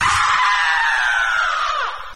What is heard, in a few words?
scream